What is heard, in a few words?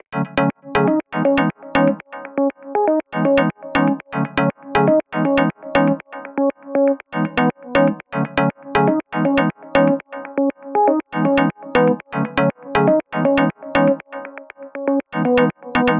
songstarter 120 C#m, Pop; SD PASADENA Pop Sample Pack; Slate